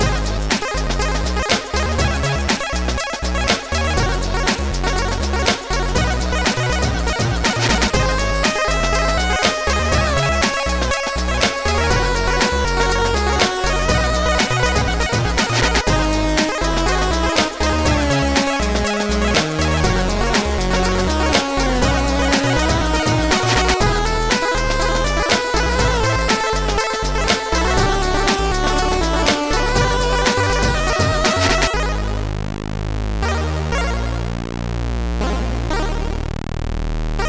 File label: chiptune arabic trumpet